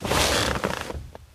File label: Leather Chair Sit Do; chair mvmt